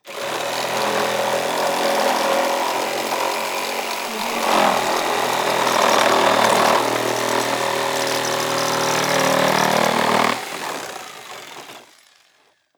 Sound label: sabre saw cutting old wood; stormwaveaudio